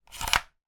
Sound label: radio aerial telescopic extend